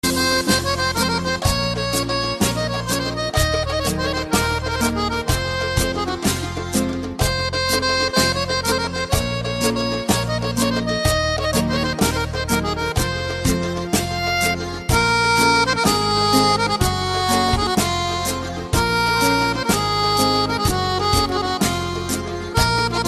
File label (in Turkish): Cerkez Muzigi